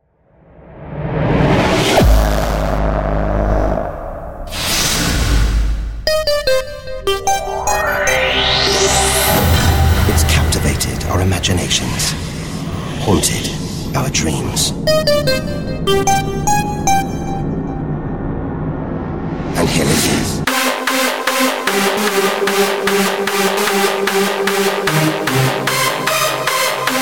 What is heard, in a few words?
hardstyle